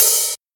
nice open high hat d key, Royality free open hi hat sample tuned to the D note